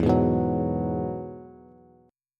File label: E Major Add 9th, Bass; strummed; JGuitar